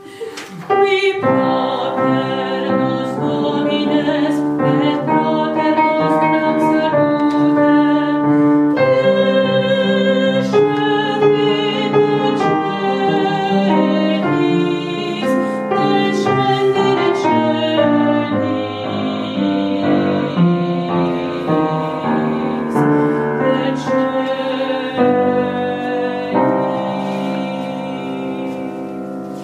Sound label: mezzo